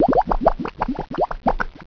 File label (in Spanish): tubo ensayo; primartis; sonidos